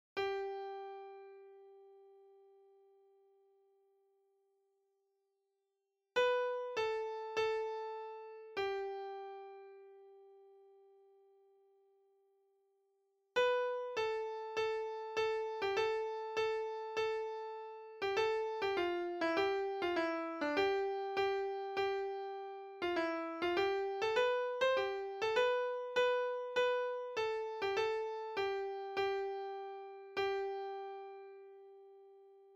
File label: Sop Midi Pno